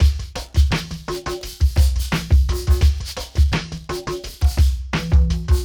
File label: percu